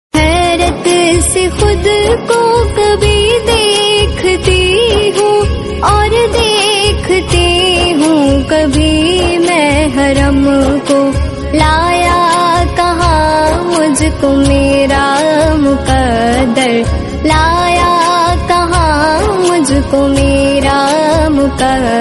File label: female naat islamic naat